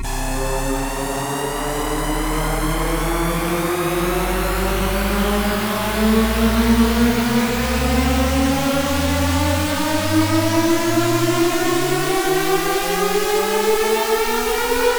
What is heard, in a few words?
Climber Riser, 128 BPM C; Risers; Cymatics